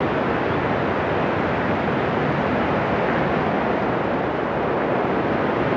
wind; ninjago

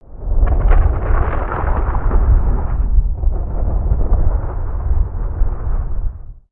Bridge Rumble

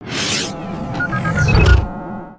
Hologram Map, ambience; Paradox; nexus tower

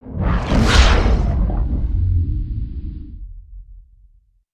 Rocket swoop In

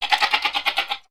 Pets Goat Happy; creatures; Lego

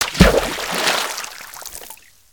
shark splash

